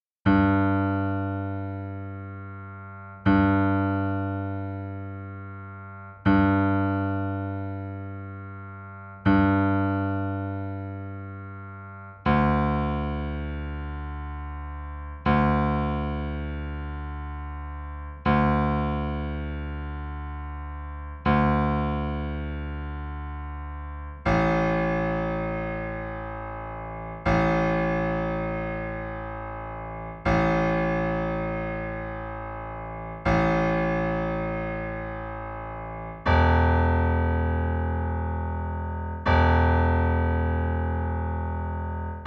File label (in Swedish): Stamtoner bas